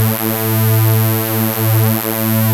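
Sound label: RAVE synth A3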